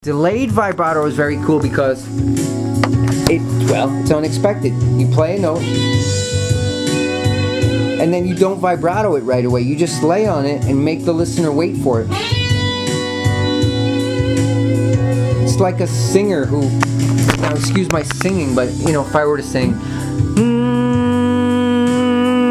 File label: expressive elements delayed vibrato